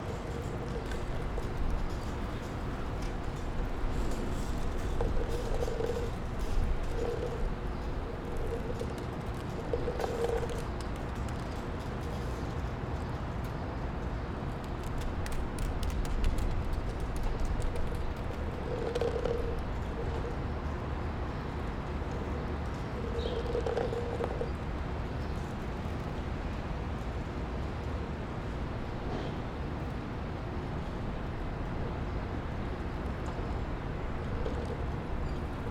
QUIET STREET Windy alley plastics and papers dance in the wind